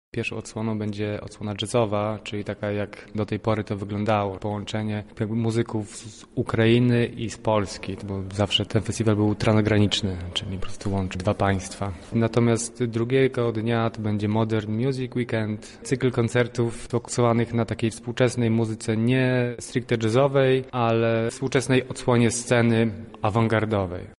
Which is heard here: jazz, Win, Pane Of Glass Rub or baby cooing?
jazz